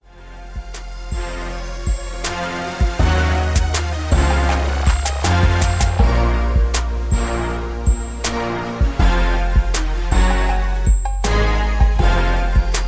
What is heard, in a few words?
80BPM